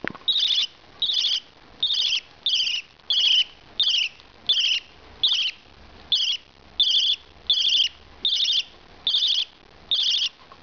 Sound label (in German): bettelndes wellensittichkueken